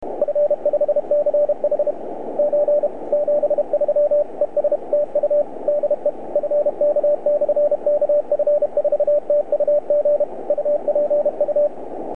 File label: cw